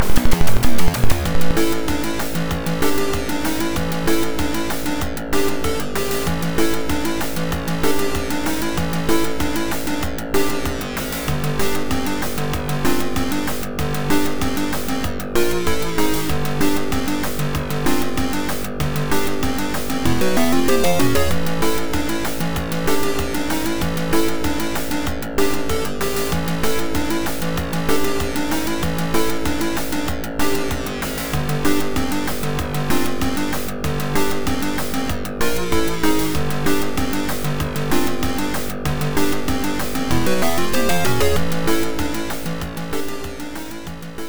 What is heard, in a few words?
Fighting - Battle; Adventure Tsukuru II; PC-98